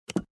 click

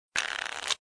card drop

